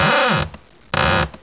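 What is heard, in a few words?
CREAK DOOR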